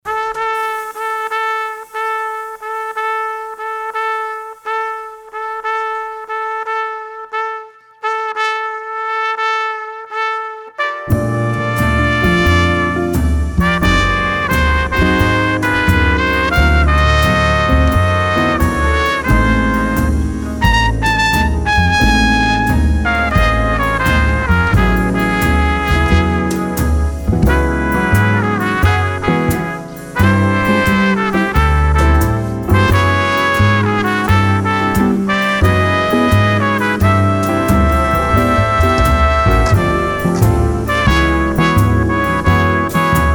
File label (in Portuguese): Trompete